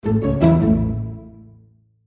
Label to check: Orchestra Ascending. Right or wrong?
Right